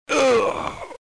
zloumishlenniki atakovali zvukovie effekti

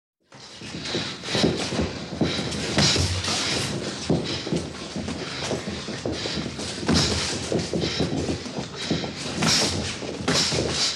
boksiruyut sparring

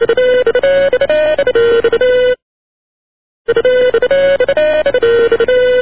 melodiya na cherno belom mobilno telefone

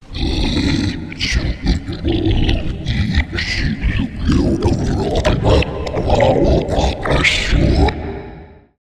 monstr richit

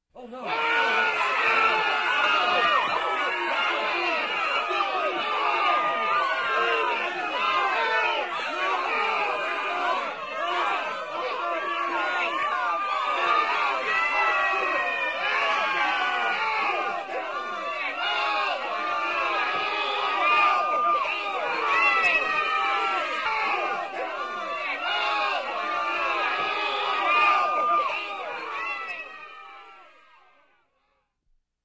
tolpa ohvachennaya panikoj

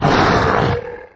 napadenie dikogo zverya

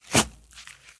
hrustyashij chyotkij zvonkij zvuk votknutoj lopati v pesok

